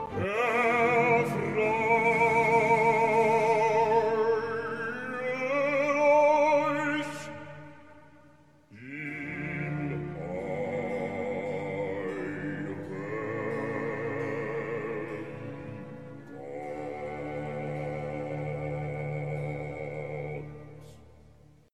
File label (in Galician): Baixo, Voces máis graves; Kurt Moll